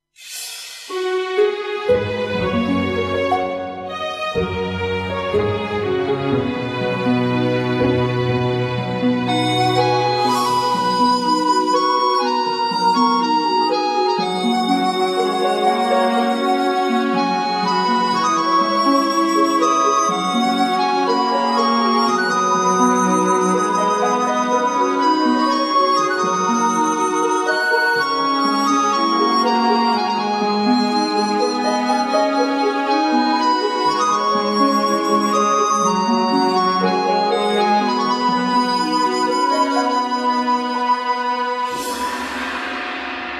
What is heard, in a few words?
fairy flute